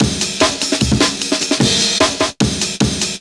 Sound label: Terrorist Amen, Jungle, Breaks; Blu Mar Ten - Jungle Jungle - 1989 to 1999 Samplepack